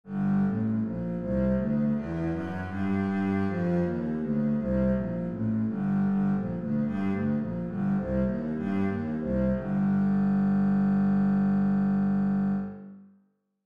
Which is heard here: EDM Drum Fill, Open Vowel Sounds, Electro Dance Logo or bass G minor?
bass G minor